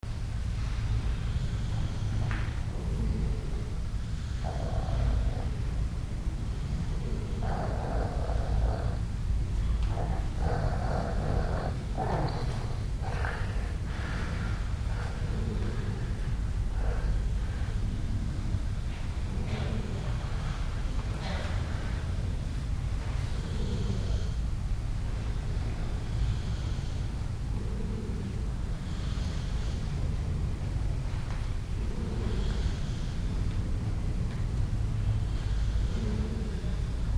snoring soundscape amidst 1500 greeks who are also in our camp; bordersounds